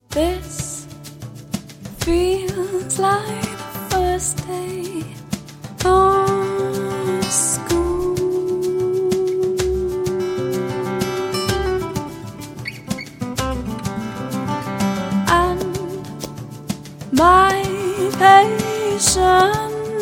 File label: english pop singer arrangement and all instruments recorded; Músico de grabación; session musician